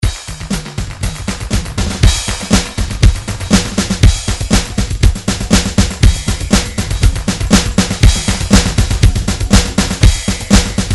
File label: drum grooves midi loops pop; Steinberg Nice Beats 1000